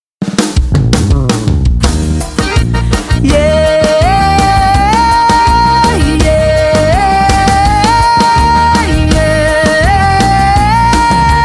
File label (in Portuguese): musica 2 voz